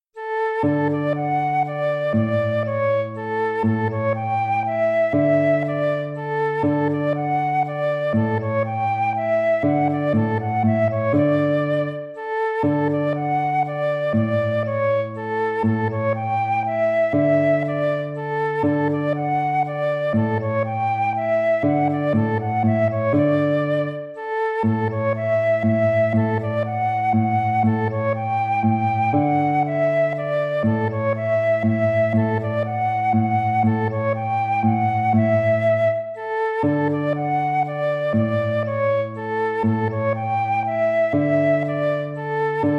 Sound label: waltz; Carulli Ferdinando